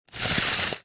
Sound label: industrial tape measure retract slow close up